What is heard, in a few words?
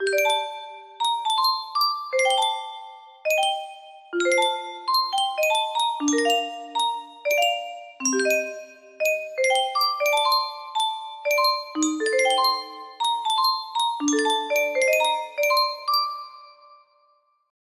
music box vers; kairi